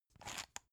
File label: pepper mill soft; gastronomie; soundpool